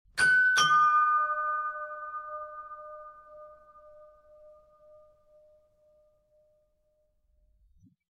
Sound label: doorbell sounds; tilt bell